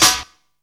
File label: noisiest, snares; Zero-G - Funky Elements